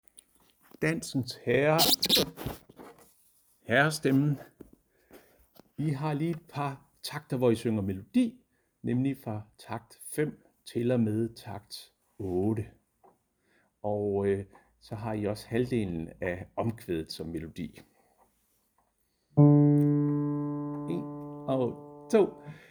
Herrer; harlev koret

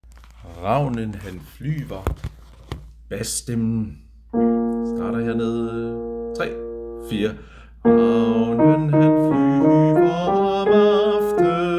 bas, koret; Ravnen

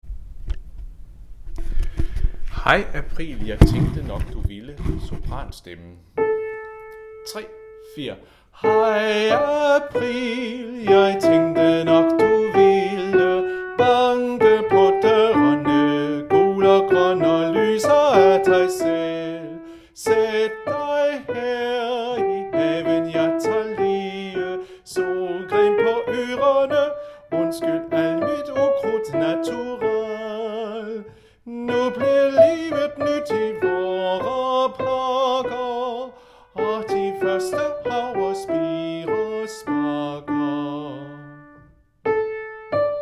Sopran; Hej april!

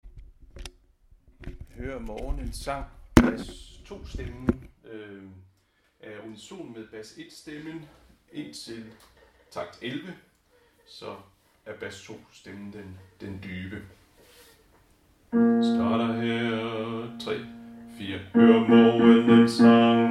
bas; Hør morgenens sang

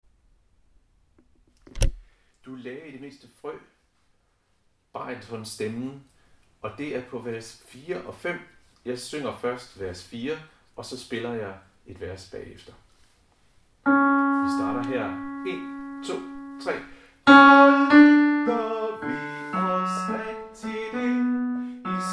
Du lagde i det mindste frø baryton; harlev koret

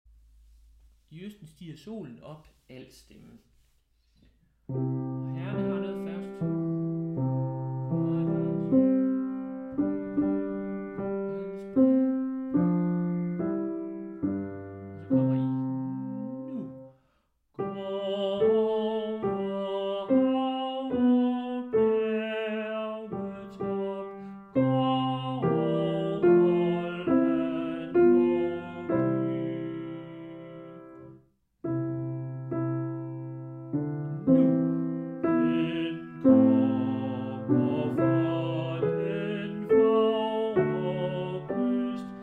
alt, harlev koret; I østen

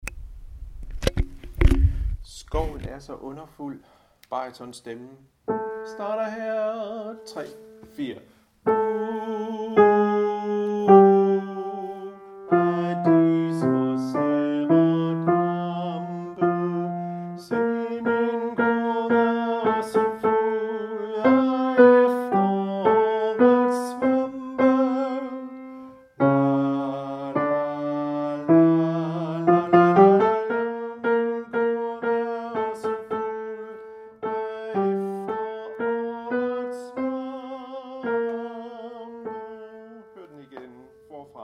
Skoven er så underfuldm baryton; harlev koret

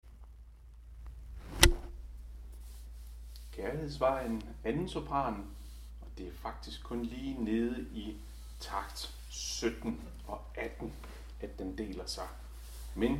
2. sopran; Kærlighedsvejen

